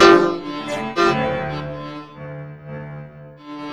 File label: STERNWAVE; USB Soundscan vol.33; AKAI; Disjointed Textures